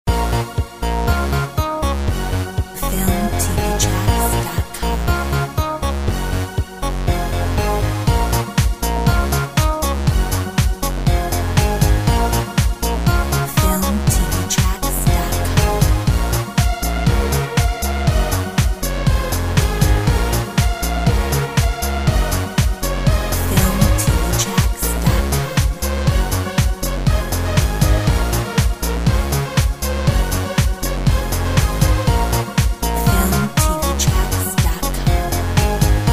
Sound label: Pop diva